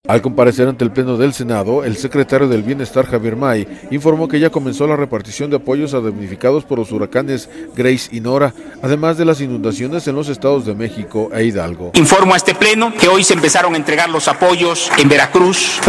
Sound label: aaah